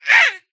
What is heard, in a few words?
Throwing